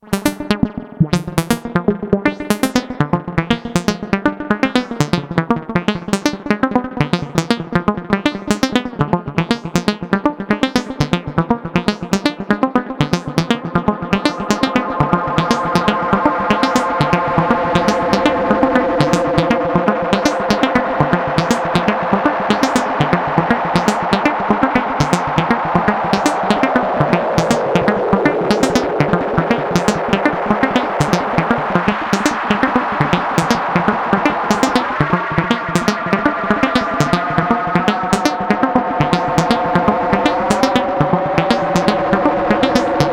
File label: Dreadbox Chromatic Module Delay